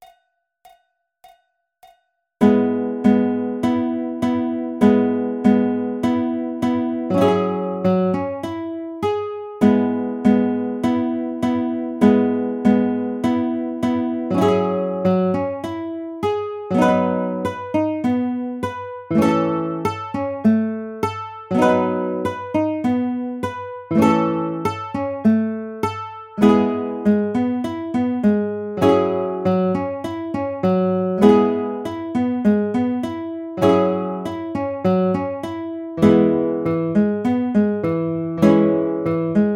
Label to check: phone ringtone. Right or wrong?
Wrong